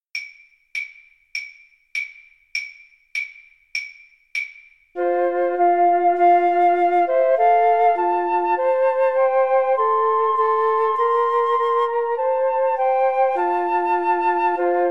Flute Trio

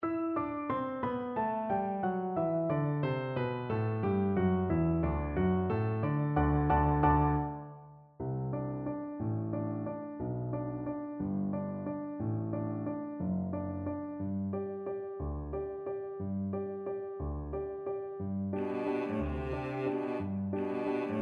cello

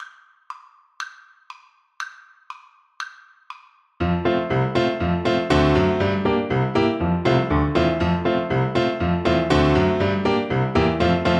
horn, French Horn, polka

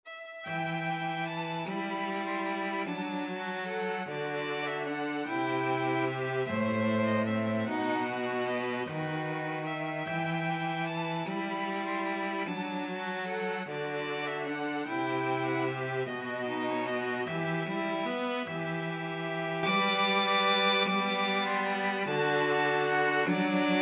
string trio

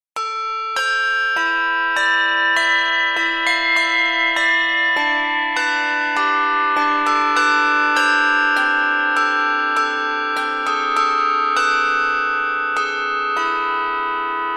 bells

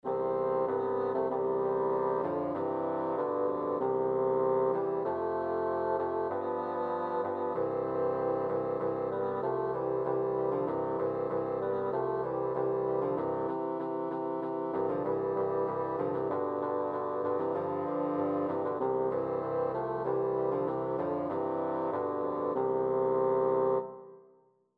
bassoon quartet

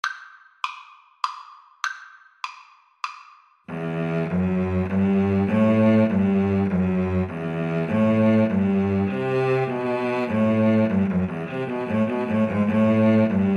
Minuet Viola Cello; arne

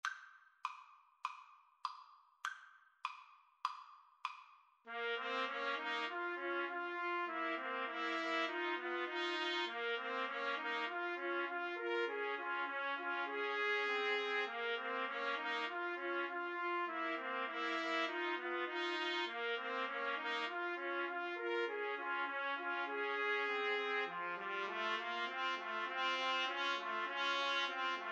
2 Trumpets Trombone; grieg mountain king

